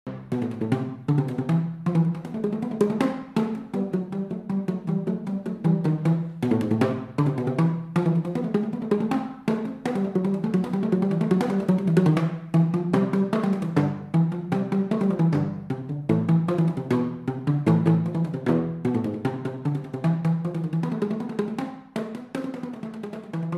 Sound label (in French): musique, instruments insolites